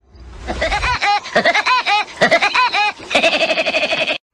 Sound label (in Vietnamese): tiếng cười của chim gõ kiến; woody